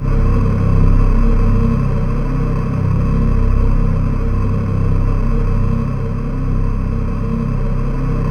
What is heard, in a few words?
C1, Synth Leads